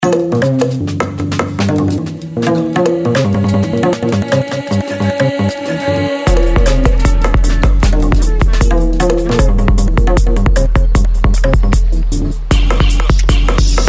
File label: funk; cricket